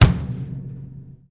bass drum muffled

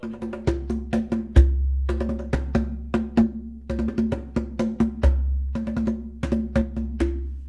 small conga indian

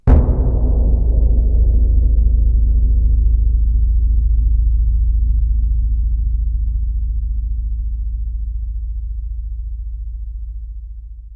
biggest bass drum open

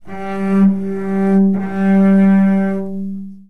3 same notes, cello

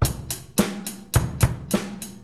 rock beat

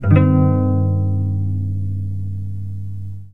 plucked chord, cello

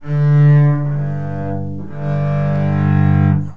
2 notes then chord, cello

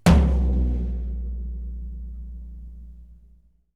floor tom double

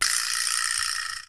vibraslap, percussion

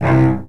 short strum, cello

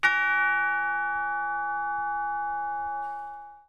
tubular bell mid

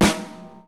snare double

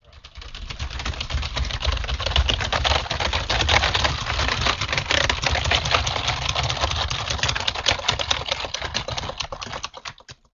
keyboard pan

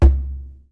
small conga

